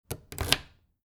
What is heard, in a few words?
key door insert; transportation